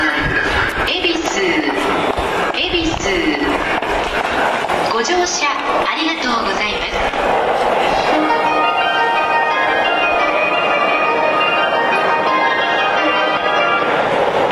発車8音色c, 恵比寿駅, 山手線; 埼京線・湘南新宿ライン; 新宿・池袋方面

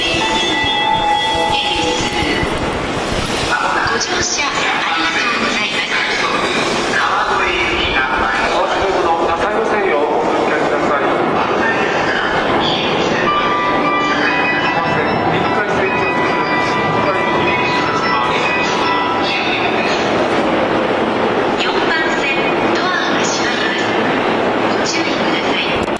恵比寿駅, 埼京線・湘南新宿ライン; 山手線; 渋谷・新宿方面

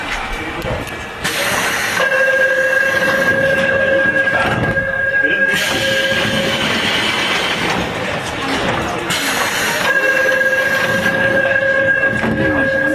走行音, 2号線, ソウル地下鉄; Seoul; 新川(Sincheon)-蚕室(Jamsil); 2号線の車両は音量が小さく、ほとんど聞こえません。

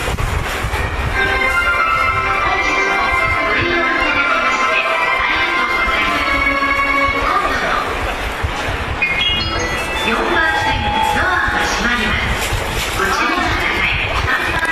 発車3音色a, 恵比寿駅, 埼京線・湘南新宿ライン; 珍しいGK製メロディです。

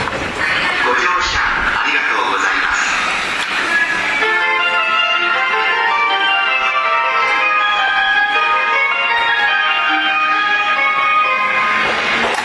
発車1音色c, 恵比寿駅, 山手線; 品川・東京方面

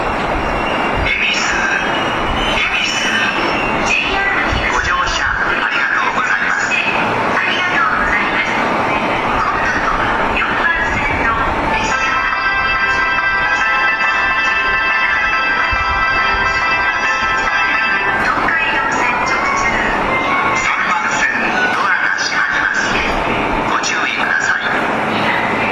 恵比寿駅, 埼京線・湘南新宿ライン; 大崎方面

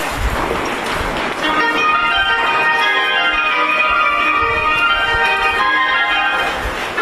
発車8音色c, 恵比寿駅, 山手線; 埼京線・湘南新宿ライン; 新宿・池袋方面; GK製です。

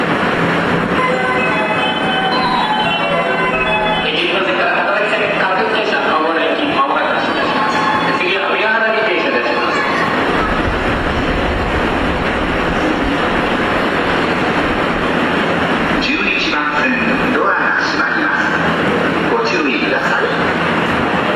Water Crown, 聞き取りづらいです。; 埼京線; 池袋・新宿方面

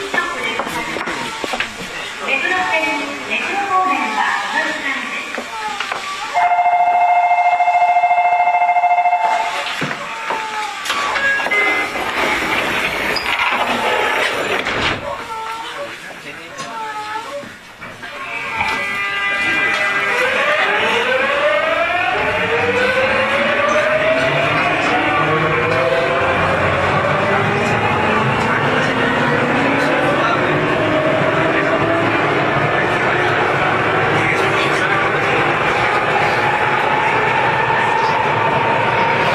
走行音, 9000系; Tokyu; 田園調布-自由が丘; 東横線; 車内には一部ボックスシートも設置されています。

